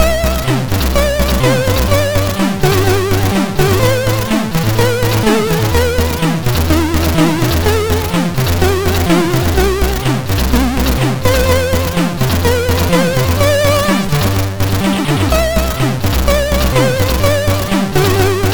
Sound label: Russian anthem sid MOS6581R4